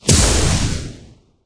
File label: ener wp; StarSiege